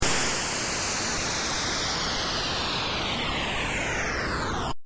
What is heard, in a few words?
engine down